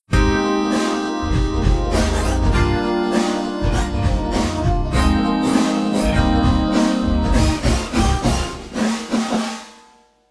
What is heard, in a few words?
Pop; speed 0.83; tsmtoolbox; phasevocoder